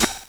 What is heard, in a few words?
dancemusic hihat